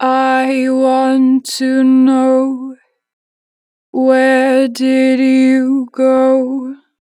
I want to know, 4 bar vib, Vocals, Natural Vibrato; Harmor Vocals